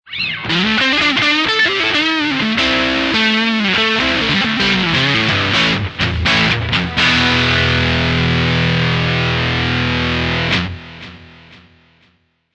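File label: alchemist 90 neck dirty, guitar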